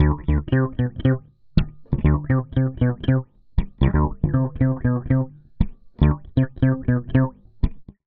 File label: DISCO BASS, 118 BPM, D